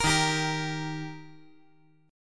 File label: Eb Major Eb down 5G,4C,5E,5A; strummed; JGuitar